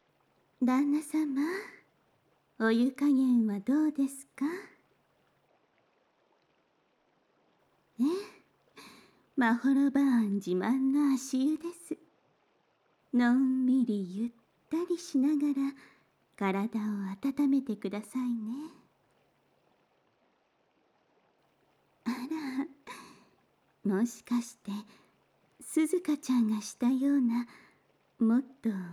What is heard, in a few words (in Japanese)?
足湯で耳かき; まほろば庵 秋葉1